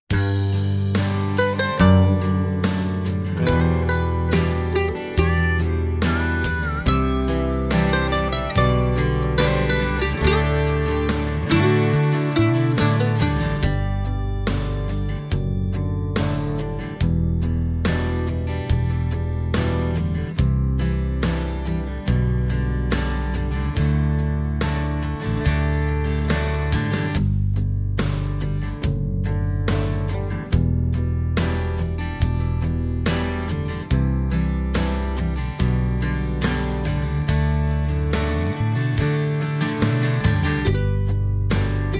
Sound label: Instrumental Sample